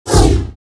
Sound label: ATTACK JUMP